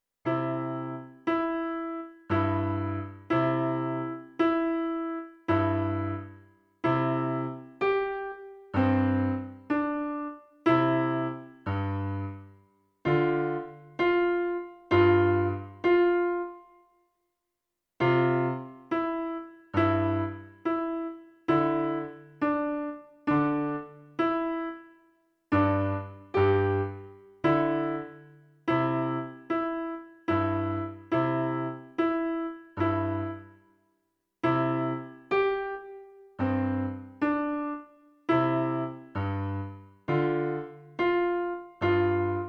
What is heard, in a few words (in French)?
jingle bells